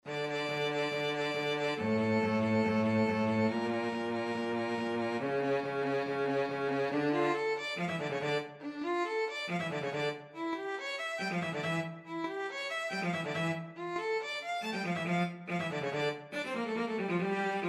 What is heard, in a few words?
violin cello duet